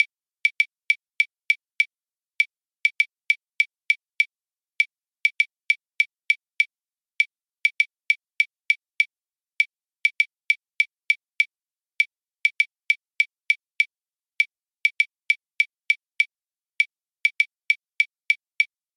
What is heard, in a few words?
afro son